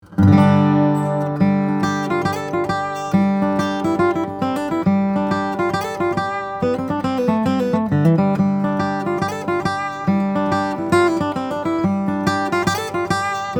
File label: Dreadnought, steel string guitars flattop; 1985; cherry; spruce; john arnold